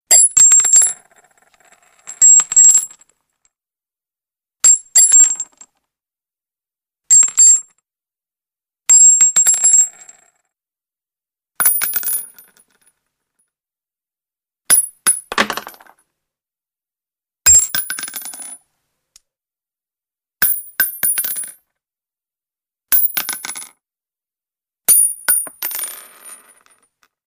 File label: Shell Casing Hits St, handgun